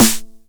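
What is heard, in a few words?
909 SD Tape, snare drum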